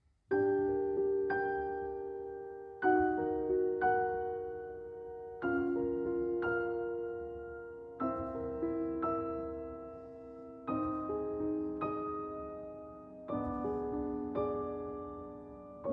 Piano Duet; Orphée; Act 2 - X. La Chambre d'Orphée

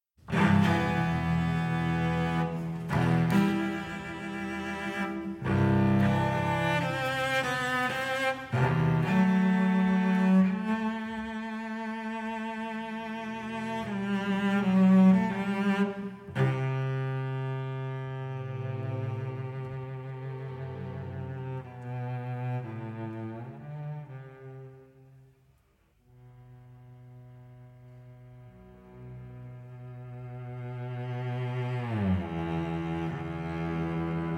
Cello Concerto in E Minor, Adagio

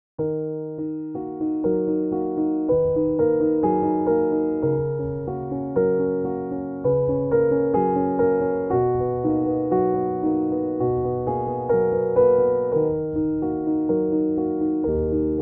Solo Piano Version

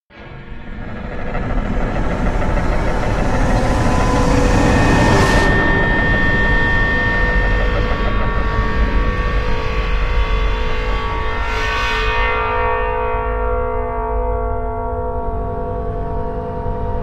Howls and Bells

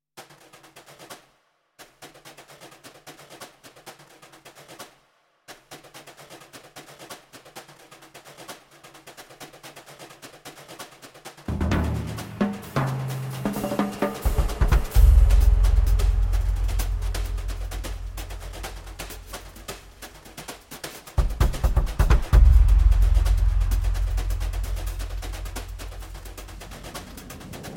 Drums

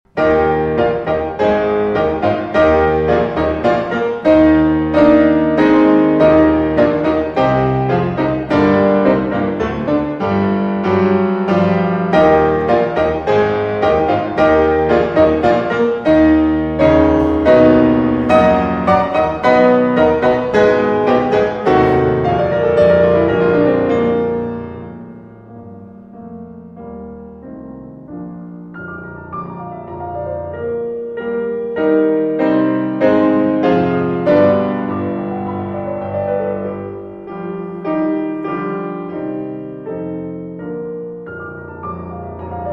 Piano Pieces, Rhapsody, Allegro risolut; 4 Piano Pieces, Op. 119; Brahms

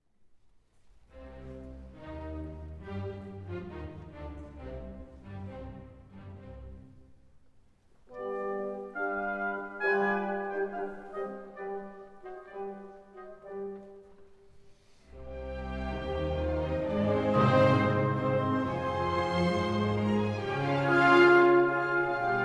Piano Concerto No. 3 in C Minor

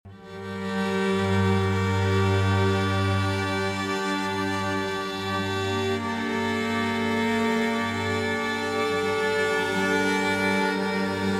Sarabande - Ambient

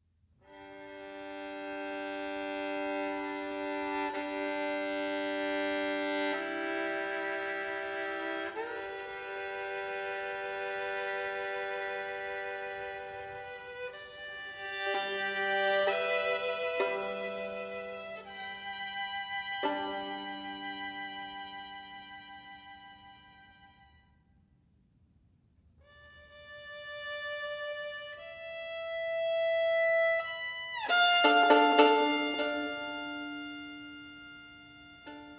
Sonatas For Violin Solo, Sonata No. 5 in G Major; I. L'Aurore; Ysaye; Op. 27

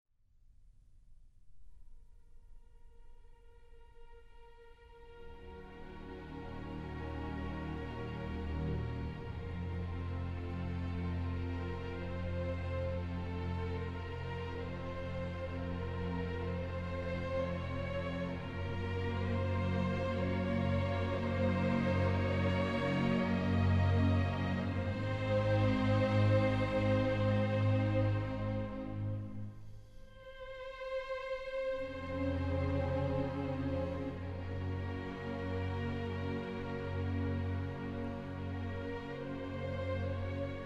Adagio for Strings; Barber

